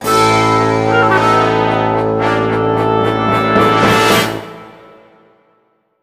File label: gameover; Patchwork Heroes; PSP